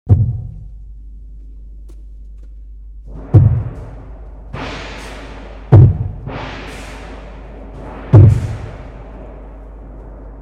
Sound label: Percussion Trio